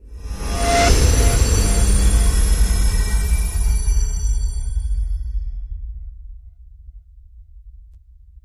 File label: Swell